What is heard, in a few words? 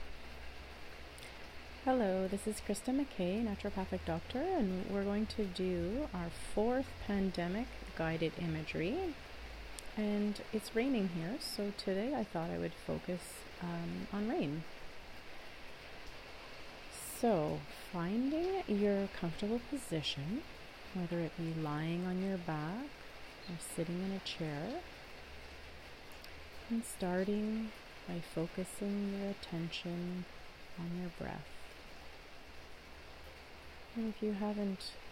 Rain